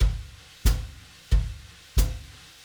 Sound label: Drums, Jazz